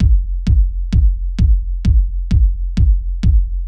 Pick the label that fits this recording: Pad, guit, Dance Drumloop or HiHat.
Dance Drumloop